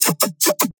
Bass Hit Jumpy E

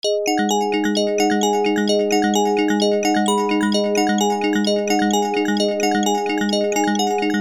Chord & Melody Loop G

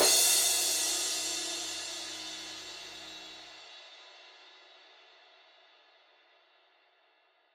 Crash FX C 127

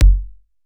70bpm Kick

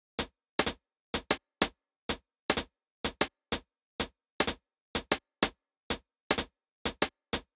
126 Top

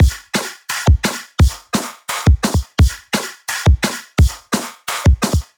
Fx Drums

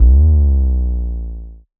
Bass Bend

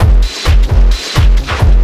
DARK DRUM 130

